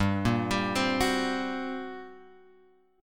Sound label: G Minor Major 7th Double Flat 5th, G; GmM7bb5 Chord {3 1 X 0 1 2} arpeggiated